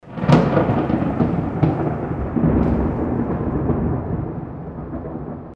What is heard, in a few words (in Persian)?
SMS, هشدار پیامک; آهنگ زنگ موبایل; گریه